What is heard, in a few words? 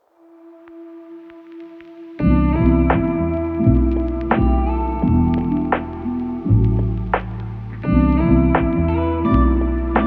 Lofi; Halo